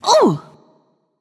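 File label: ouch, female; smite; voicepack; hera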